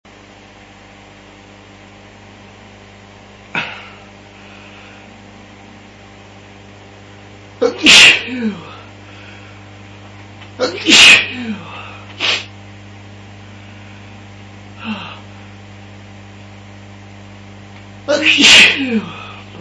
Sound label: cold, male